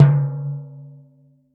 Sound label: Timbal Hembra Low